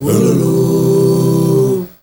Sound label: MALE GRP, M. CHOIRS, Heart of Africa